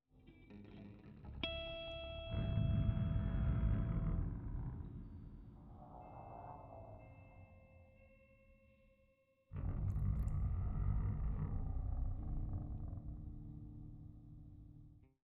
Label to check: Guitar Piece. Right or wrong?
Right